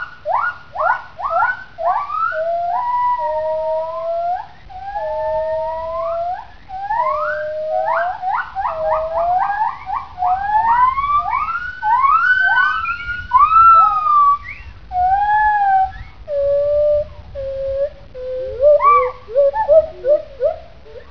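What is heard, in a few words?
agile gibbon duet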